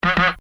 Comedy Effect Horn; Funny Sounds; Bobby Cole

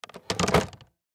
Laptop Set Down Wood Surface; Sony Vaio Computer; Technology; Computers; Blastwave

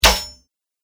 Scimitar Hits Armor, sword; Sword Sound Effects; combat sound effects

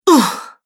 Female Fighter Grunts; Grunt Sound Effects

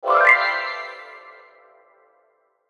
Logo Ascend Reverb; Sonic Logo Sound Effects; Production Element Imaging Element Accent Transition

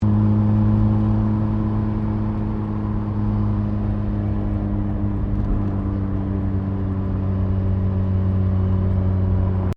CAR MAZDA RX7 SERIES3 INTERIOR EASE OFF SPEED; Car Mazda Rx7 1983 Series3; Cars; Vehicles

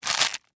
Crunch Cabbage Leaf, Vegetable; Texture; Crunches; Objects; BlastWave

